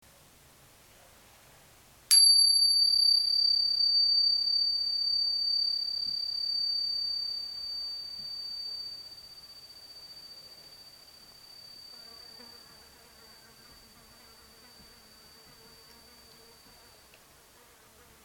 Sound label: Nickel Silver Tingsha Singing bells